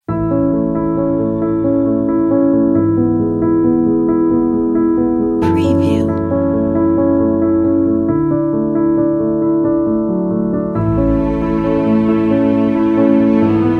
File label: wedding background orchestral strings